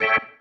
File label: Stab, Organ, One Shots